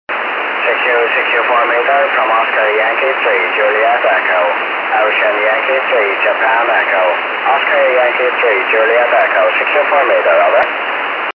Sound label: 70200khz; 70mhz